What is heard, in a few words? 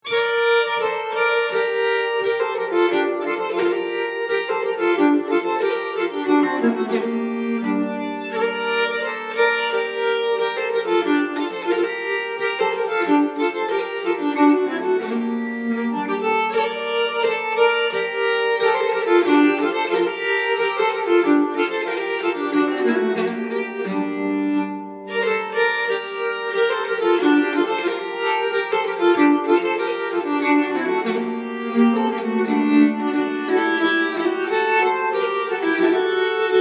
polska, nyckelharpa; efter Goran Lang